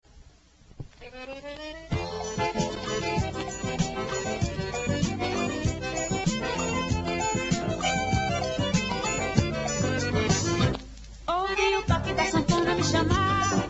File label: forro